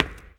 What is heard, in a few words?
step, glass; gameplay; materials